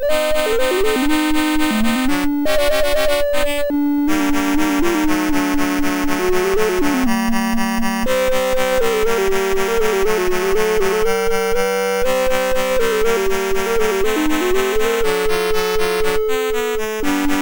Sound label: Tango, MOS6581R3